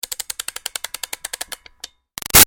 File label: Toy Music Box Wind Up Slow; Wind Up Toys (Sound Effects:Toys); Big Room Sound